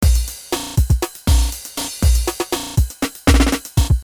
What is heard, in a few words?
drum n bass